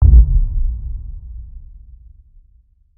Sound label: Subwoof; chivalry medieval warfare 2012 pc